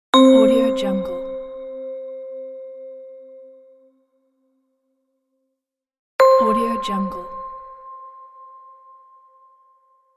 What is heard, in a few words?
bell button